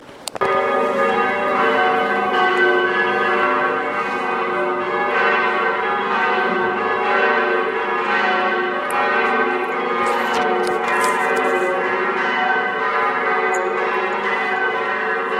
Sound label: Bell; medjugorje 2017